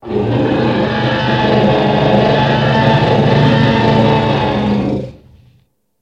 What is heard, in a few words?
Dinosaur Roar Lng Sus